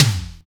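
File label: deep tom low; Drums In Your FACE!; NEWJACK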